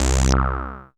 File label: A BASS; CMP - Analogon